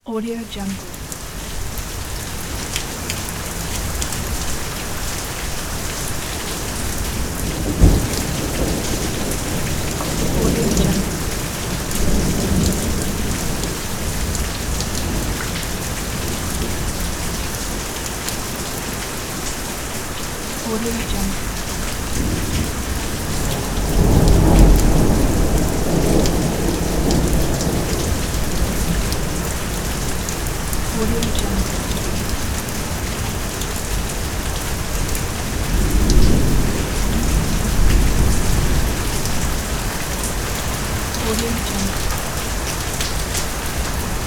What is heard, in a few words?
rain and thunder no loop recording